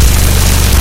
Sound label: minigun shot